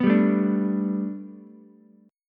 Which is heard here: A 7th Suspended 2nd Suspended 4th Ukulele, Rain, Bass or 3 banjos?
A 7th Suspended 2nd Suspended 4th Ukulele